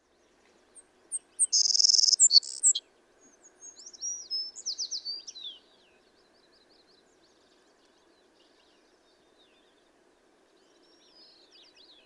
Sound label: Savannah Sparrow